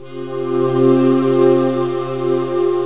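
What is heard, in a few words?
atmosphere sus4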